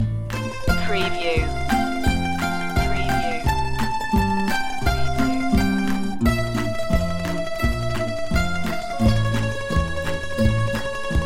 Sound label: russian romance loop